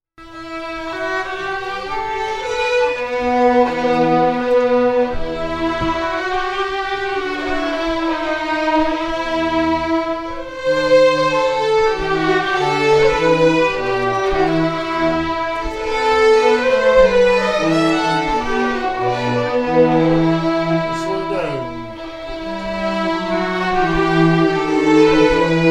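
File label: Strathspey as AIRE, Scottish Fiddling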